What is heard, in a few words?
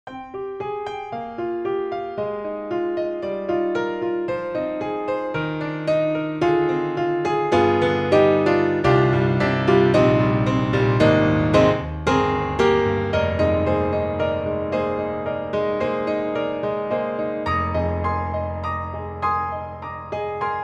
Grand Pianos Studio 7ft C7 Hall Grand; Synthogy; IvoryII